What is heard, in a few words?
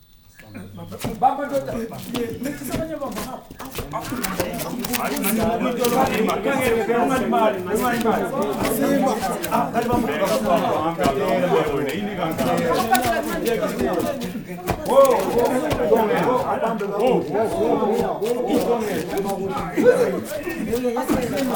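AFRICAN CROWD; Spectrasonics; Heart of Africa 1; AF.CROWDS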